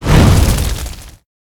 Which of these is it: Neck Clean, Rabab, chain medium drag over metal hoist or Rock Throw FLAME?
Rock Throw FLAME